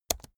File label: keyboard laptop space press slow hard